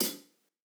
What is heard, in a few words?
Rock hihat; Reference Beat